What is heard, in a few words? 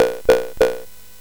CSG8580R5, GAMES; Street Rod